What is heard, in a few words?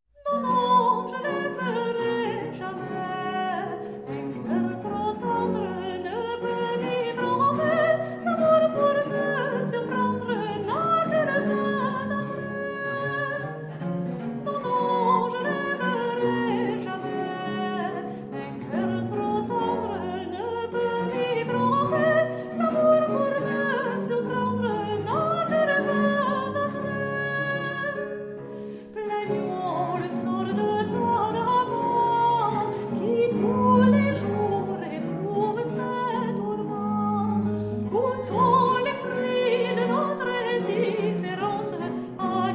Courante; Michel L'affilard; Airs De Cour Francais Du XVIIe Siecle